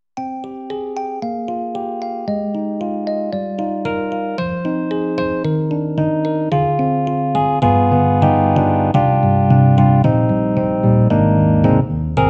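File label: EDIROL SD-90, Classical, Electric Piano, Dyno Rhodes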